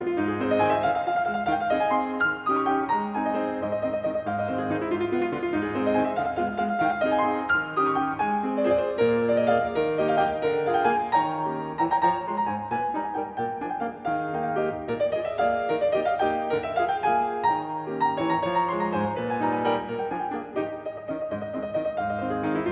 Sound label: grande valse brillante es dur op 18